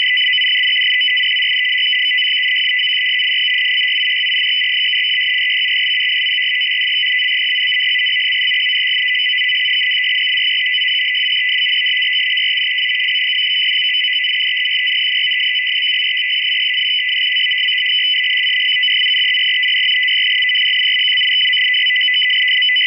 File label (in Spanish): sample 100 baudios